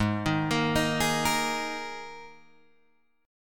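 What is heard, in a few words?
Ab Suspended 2nd chord, Guitar, Open G tuning; 6,8,8,8,9,8; down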